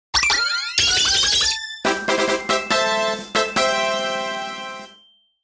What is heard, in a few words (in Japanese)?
ひみつ道具登場; ドラえもん